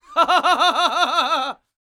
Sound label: LAUGH, ANDES; World Voices; ANDES FX; Spectrasonics Vocal Planet